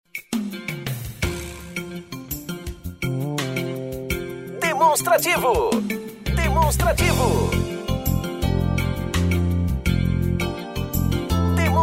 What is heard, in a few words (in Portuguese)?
tema espera